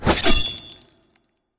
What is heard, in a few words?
Soldier Strike